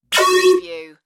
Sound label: Zap